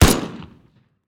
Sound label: tools gun; teardown; ps5 windows xbox series xs